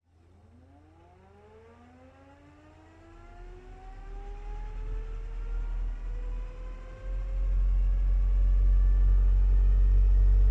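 MiG-15 UTI Exterior Start Heat Front Departure Back 20m; Bert Foley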